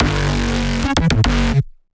Bass

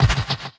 Snare, One Shots, Drums

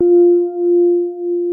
F5, Sine Piano; Orchestral; FL Keys

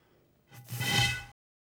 bottle, ethno, 125

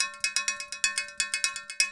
PERCUSSN ETHNO 125, Drum

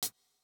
Hats, One Shots; Touch Loops; CosmicFunk

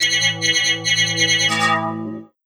TECHNO SYNTH 140; Keys

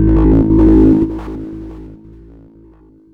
ROAR A2, SYNTH BASS

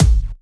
kick

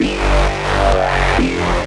Low Tearout

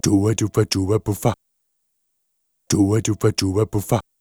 ETHNO EXTRA 90; ethno2

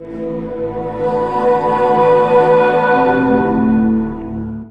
drip chords

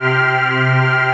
PAD; PADS 2

